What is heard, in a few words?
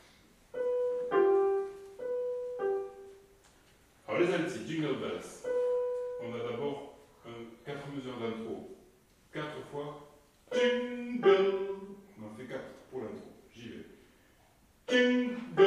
Jingle Bells Alti